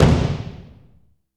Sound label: kick ambient; Rhythm Section